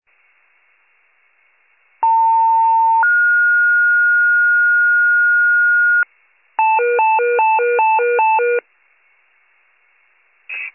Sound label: fire, pager alert